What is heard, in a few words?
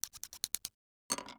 Chopsticks